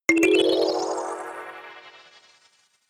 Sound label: notification kalimba magical second up